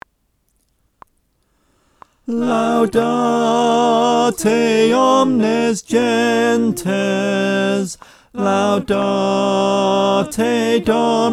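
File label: tenor, choir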